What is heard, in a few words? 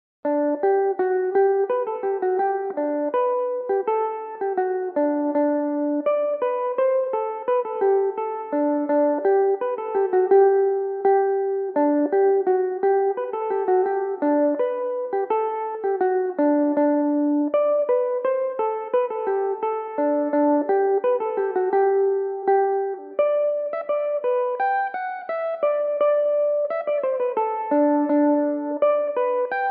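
G major belmuse, irish mandolin; At Swim With Two Birds; Belmuse EM-190 electric mandolin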